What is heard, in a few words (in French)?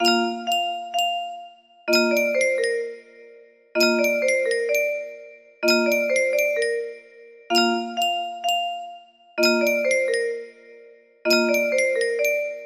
yunsheng boite a musique, music box melody